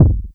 ms20 closer thud kick; MS-20 drum pack

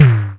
ms20 tom; MS-20 drum pack

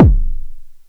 ms20 kick dull; MS-20 drum pack

